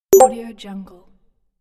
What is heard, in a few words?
notify